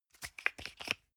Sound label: toothpaste screw cup open hard